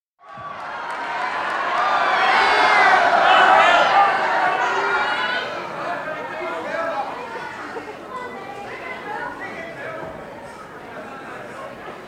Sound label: Animated crowd reaction; Crowd Sound Effects; BBC Historical